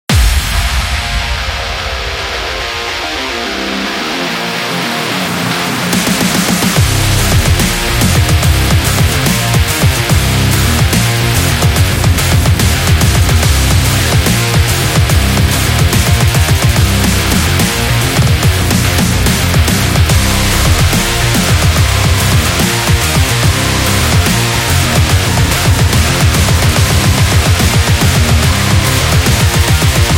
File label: Dynamic Rock Dubstep